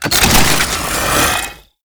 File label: Concrete Archgun Charge Up; Warframe; Archwing